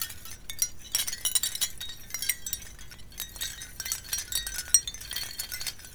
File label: Abstract Twinkle Chime Loop